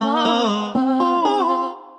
Sliced Melodies Root F, vocal; Sliced Vocal Phrases; Ableton; Factory Packs; Voice Box; Harmonic Vocal Racks

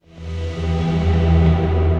Odu Pads, Ethereal Pads; Ableton; Mood Reel

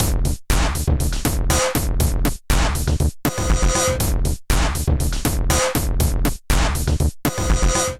Kit, Drums Hybrid; Abuse Kit; Ableton; Factory Packs; Drum Essentials

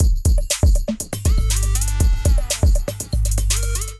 Kit, Drums; Psycho Kit; Ableton; Beat Tools

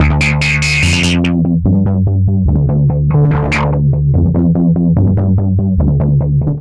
G Minor 145 bpm, Bassline; MIDI Clips; Tonal; Absolute Filth; Skitter and Step; Ableton Factory Packs

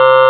android, Digital Synths

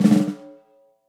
SNARE stick press roll

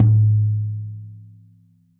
RACKTOM finger, Tom; RACKTOM SR 1209 finger; Ableton; Factory Packs; Drum Booth

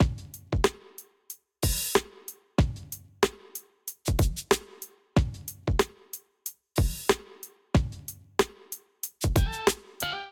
Kit Melodic 93 bpm, Drums; MIDI Clips; Kingsway; Ableton; Chop and Swing

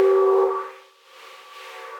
Breathing Metal; Tree Tone Presets; Ableton; Factory Packs; Inspired by Nature by Dillon Bastan

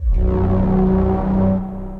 Beam Patrol, Sci-Fi Effects; Ableton; Mood Reel

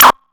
grate roomy; Drums; Ableton Foundation FreePacks

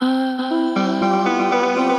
Hocketing Voices; Chromatic Voices; Transformed Voices; Ableton; Factory Packs; Voice Box

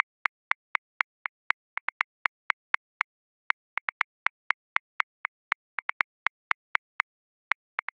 Syncopated Folk, Grooves; Drum Booth; Ableton

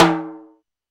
timb, drums; Ableton Foundation FreePacks

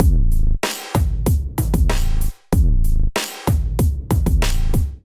Kit groove 95 bpm, Drum; MIDI Clips; Ableton; Drum Essentials

